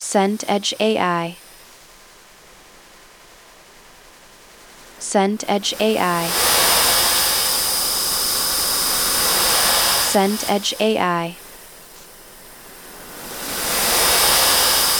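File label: ambience beach waves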